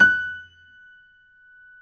piano; East West; The Ultimate Piano Collection

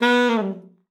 sax b3, Saxophone slides; Session Instruments; Best Service ProSamples vol.42

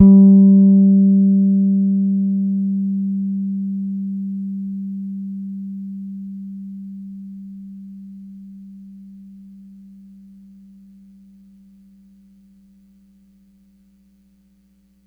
Ds G4 hard, Bass, Pedulla Thunderbass; Giga Samples Collection

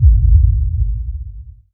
Sub Kicks, Bassdrums

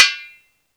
NA, Tabla; Mango Loops

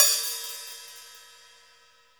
HAT OP, NATIVE HI HAT

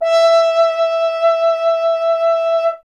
F. Horn; Miroslav Vitous; Solo Instruments VOL-2

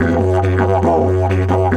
F 140, DIDGE RIFFS; Big Fish Audio - Didgeridoo and Other Primitive Instruments

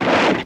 SWISH SEQ, swishes; Total Control - Action Cutz

